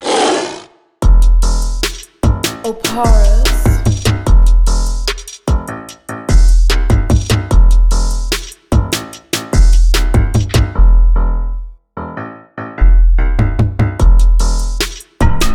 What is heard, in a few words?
Ball 74BPM, instrumental